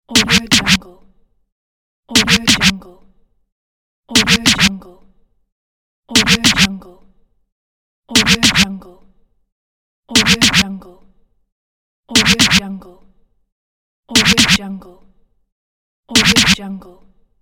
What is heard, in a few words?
vinyl double scratch; dauzkobza